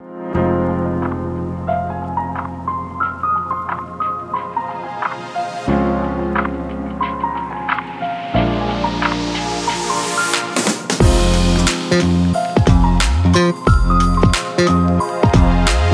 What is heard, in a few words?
Jazzy Urban Beat; AdobeStock